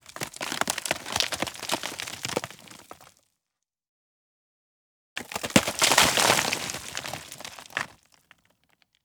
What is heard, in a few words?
ROCKS Rock Roll Movement; Tatak Audio; Rocks and Debris